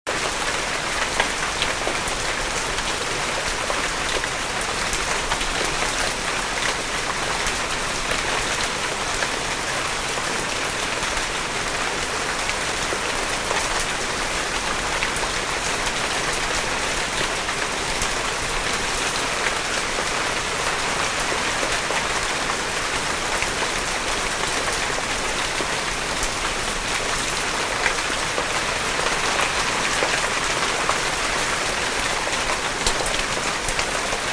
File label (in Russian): hlestkij dozhd